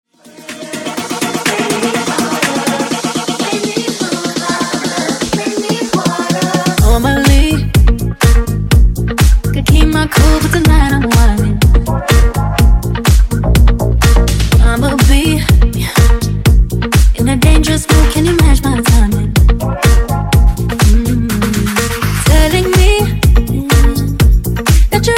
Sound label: Funk Nu Disko 124 Bpm